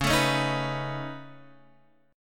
C sharp 7th Suspended 2nd Sharp 5th, C sharp, down, Guitar, Drop D